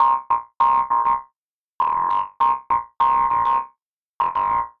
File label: Classic Funk Synth ringmod